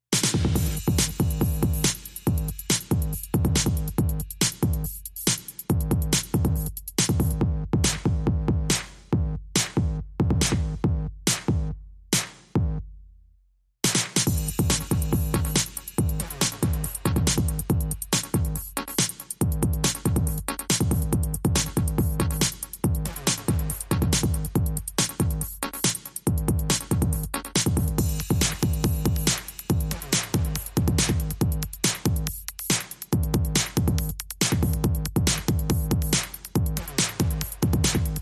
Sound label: 8 Bit, Bonus Beats